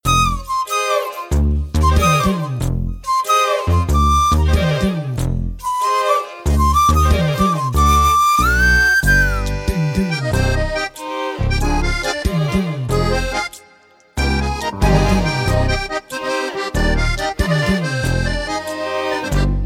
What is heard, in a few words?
flute; tamil; irudhi suttru